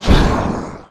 Devil Fire

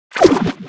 Weapon Exhausted

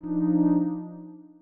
notice level count Down edge

